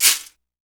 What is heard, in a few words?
Cabasa, Percussion Kit; Akai MPC2500